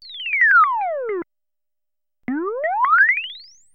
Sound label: BLEEP DOWN